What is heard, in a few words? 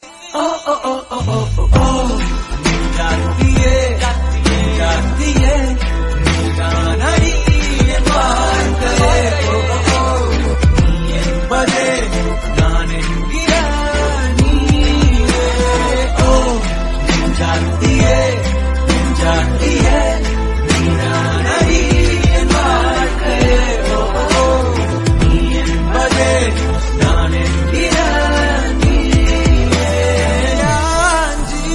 Instrumental Bgm Ringtone